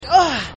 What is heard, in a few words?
hit; power blue